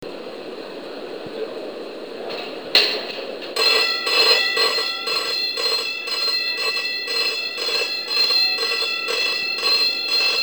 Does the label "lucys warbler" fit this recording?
No